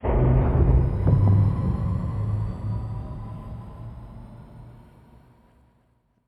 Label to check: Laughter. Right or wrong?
Wrong